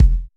low kick drum sample e key, steel kick drum sound tuned to the E note; kick drum samples